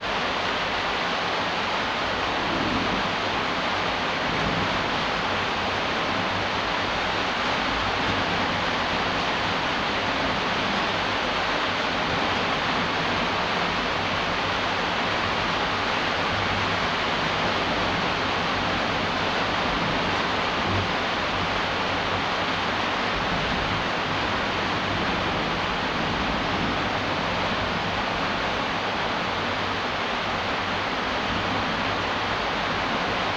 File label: Rain in the Evening Douala Cameroon